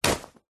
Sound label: clothing jeans denim pants trousers catch; zapsplat